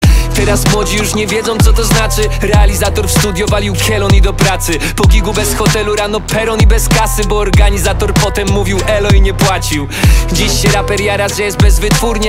koto

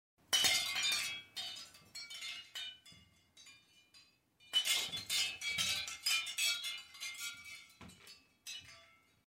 Wind Chimes

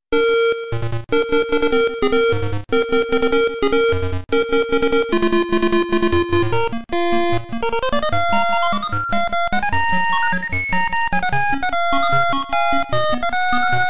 Legend of Zelda 8 bit

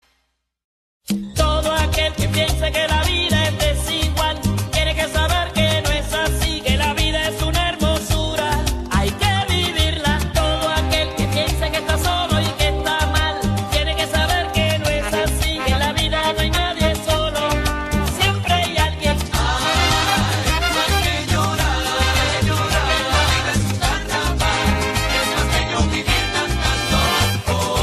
salsa

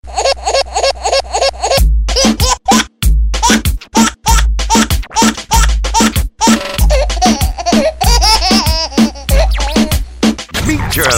Very Funny Baby

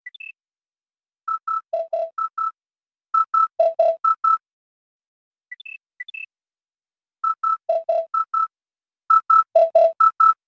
Galaxy S10 Orbit

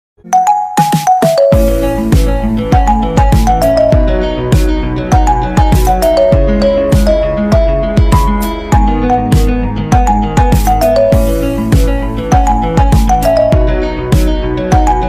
Ringtone Marimba

